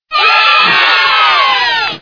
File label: yay; olpc games; SnowFight